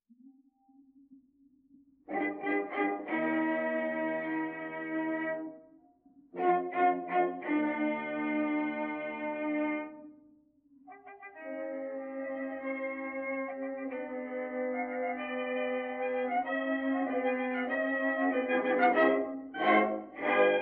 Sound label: Fifth Symphony 1st movement, denoised; Victor Concert Orchestra